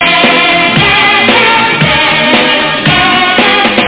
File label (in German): gesang